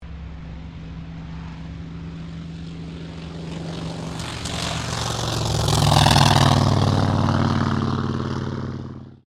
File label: AIRCRAFT T-28D TROJAN TAKEOFF; Airplanes (Sound Effects:Vehicles)